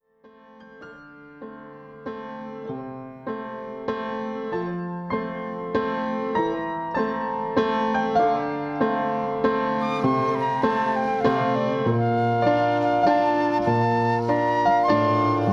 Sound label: clarinet flute; Flute, Clarinet, Bass clarinet (film score)